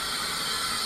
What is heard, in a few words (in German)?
bremse; Vehicles; GT6M